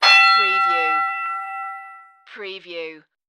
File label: Boxing Fight Bell Single